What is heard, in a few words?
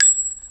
bullet shell, impact; SINDEMO